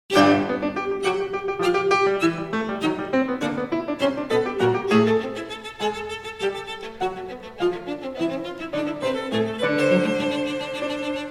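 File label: piano trio; smith julia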